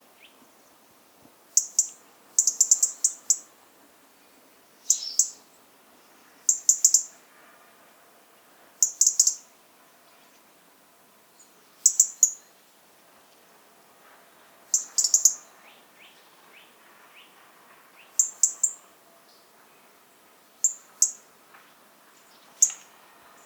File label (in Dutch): roodborst roep